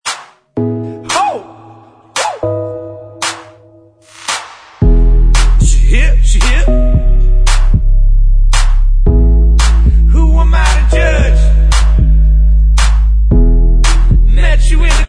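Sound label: backing vocals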